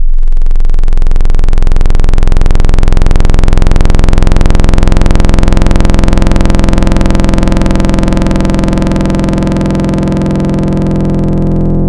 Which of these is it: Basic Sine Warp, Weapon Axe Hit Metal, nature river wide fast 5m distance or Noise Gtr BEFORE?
Basic Sine Warp